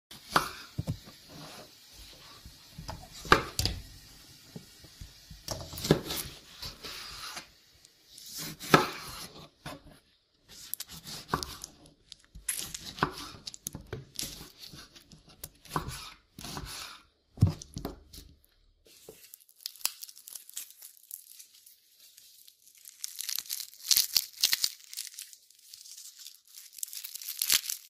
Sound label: chopping